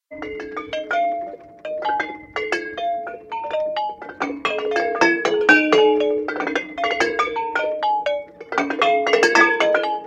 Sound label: bicycle arpeggio